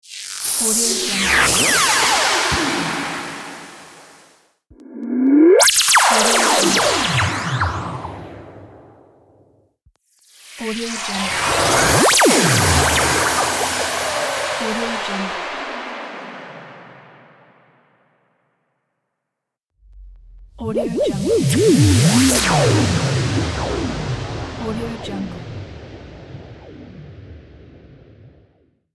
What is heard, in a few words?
laser